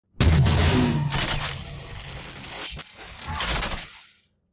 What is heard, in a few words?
shot